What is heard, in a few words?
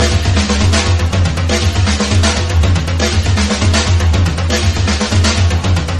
drums; horde